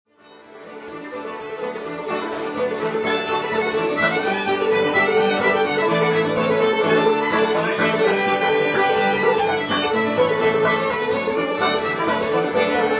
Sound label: Fiddle, Banjo, Guitar; Old-Time JAM; Cider